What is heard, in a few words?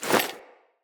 Foley Action Default Melee Swipe, Clothing; Player